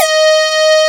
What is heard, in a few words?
PL MOOG; Wizoo - Powered Nord; STEREO LAYER